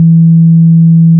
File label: BASS, Tekno Industrial; East West